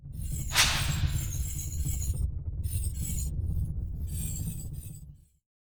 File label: In Flight Whip Crack